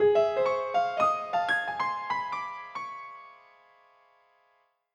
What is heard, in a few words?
Scary Music